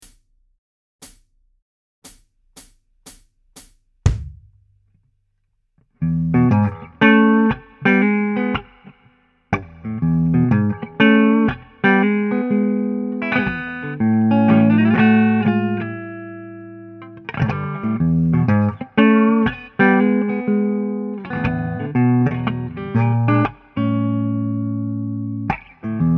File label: Old Style blues